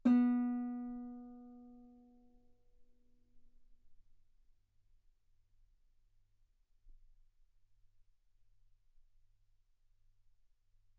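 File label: Guitar ff B string B3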